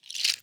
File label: Goat Toenail Shaker; World Percussion; Cymatics; Emperor World Percussion